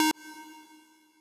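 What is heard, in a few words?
square lead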